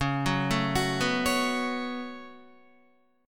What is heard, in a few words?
Db 7th Suspended 4th Sharp 5th Guitar; arpeggiated; chordshape; JGuitar